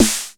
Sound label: S 909, SNR909PITCH7; TR 909; SNARES